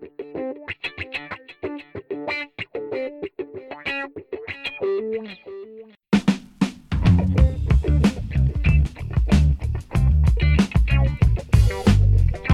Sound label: Funk